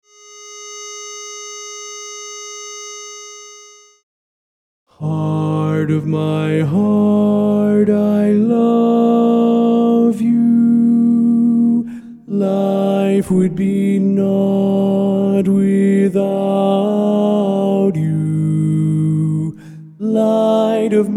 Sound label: Baritone